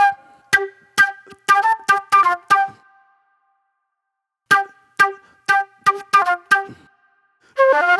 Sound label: Fast Funk Flute